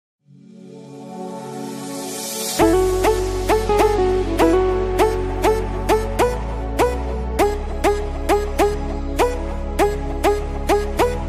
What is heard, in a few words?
Ringtone